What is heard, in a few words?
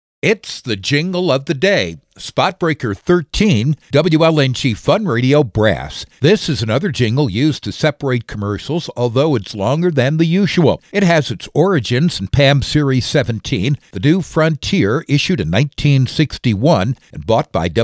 Spot Breaker Fun Radio Brass; WLNG